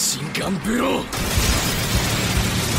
Machine Gun; Blow

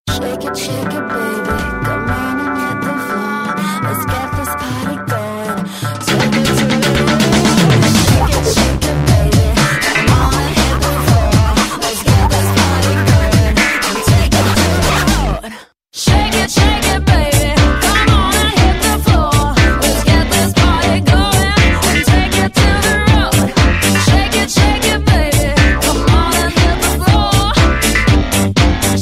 Micromax Q55 Bling

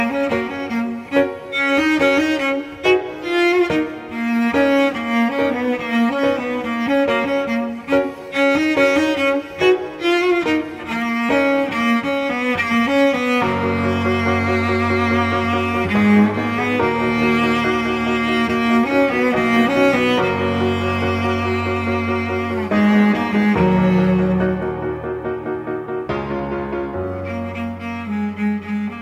violin

